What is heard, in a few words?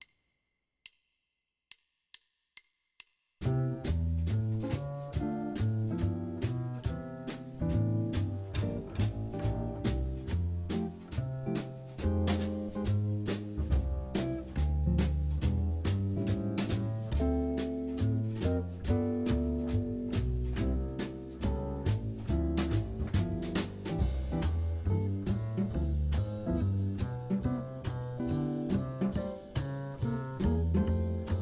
major swing bop